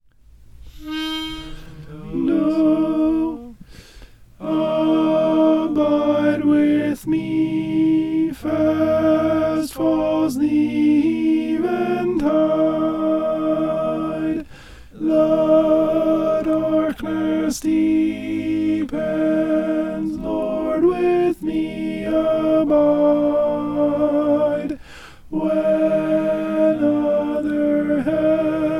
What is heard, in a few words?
alto